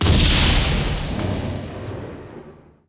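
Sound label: mine explosion; wob2 demo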